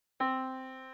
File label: yamaha Disk